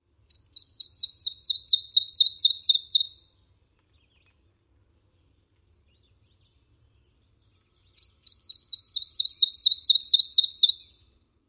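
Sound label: songs, ovenbird; TN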